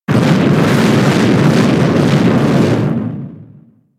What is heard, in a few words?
rock slide